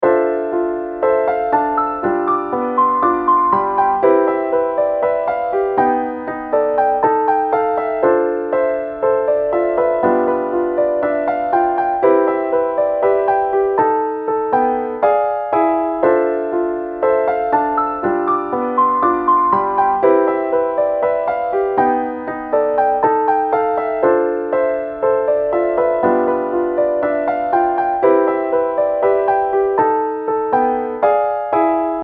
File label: piano